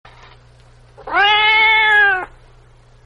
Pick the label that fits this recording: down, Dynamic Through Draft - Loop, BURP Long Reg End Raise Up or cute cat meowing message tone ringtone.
cute cat meowing message tone ringtone